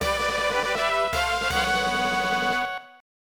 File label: Bonus Round Start; Namco Classic Collection Vol. 1